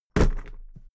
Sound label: briefcase plastic drop; industrie wirtschaft; bueroarbeit